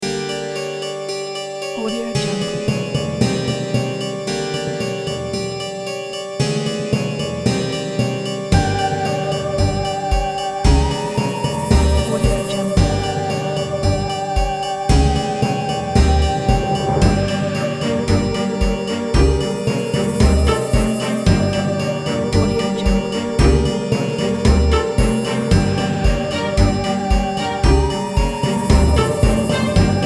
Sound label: halloween